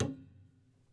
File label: Prepared Piano